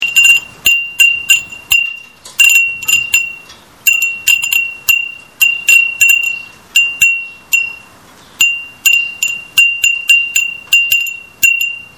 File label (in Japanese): fuurinn